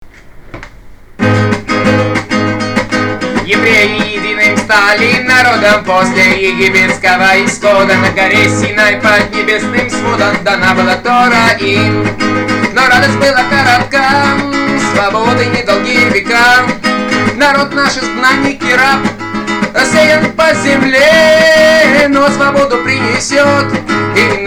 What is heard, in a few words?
song rus evrei